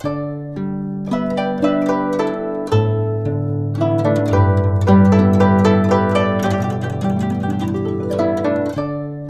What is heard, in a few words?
GUCHIN 110 G; INIS - Opium